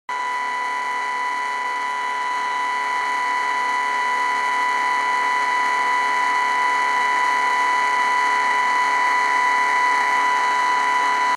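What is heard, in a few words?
XHDATA D808 interference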